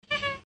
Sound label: hehe cat